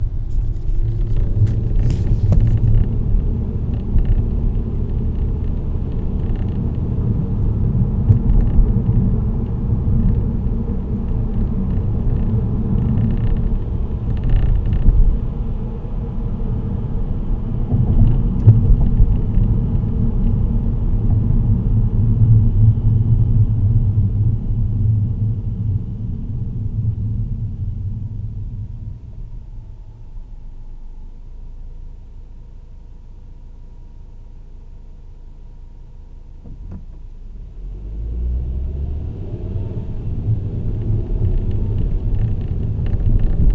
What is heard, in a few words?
wiper rattle